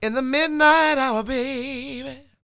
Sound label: SING W, Vocal Planet Blues & Soul, BLUESY; ILIO